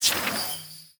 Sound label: ui refresh